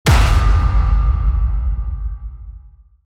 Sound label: table smash